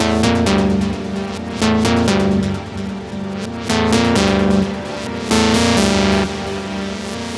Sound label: Synth Loop 130 A, Techno; Loops; Synths; GhostHack; Ghosthack - Free Techno Sounds 2024